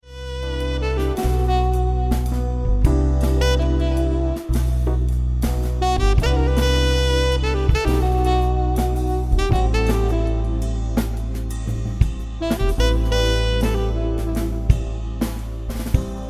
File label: Solo